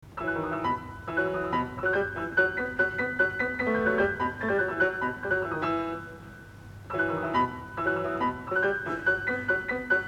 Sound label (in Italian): Trovatore (coro di zingari e canzone); G.VERDI